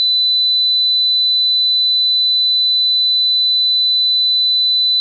ren 4kHz

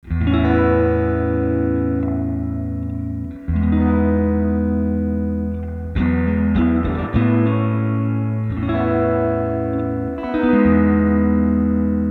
Gitarr roland space echo spring reverb

